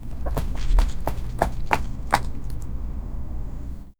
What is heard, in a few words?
a video game animal character; character actions